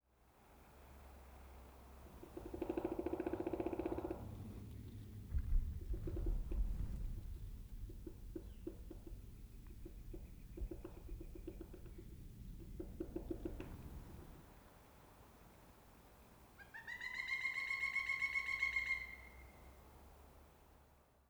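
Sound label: Bird Pileated Woodpecker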